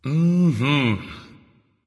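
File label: nonverbal content; smite; voicepack; ullr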